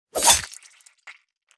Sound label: attack, cursed bear; quitoypongo